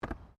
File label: RUGBY BALL HANDLE; Various rugby sounds; Miscellaneous Sports (Sound Effects:Sports)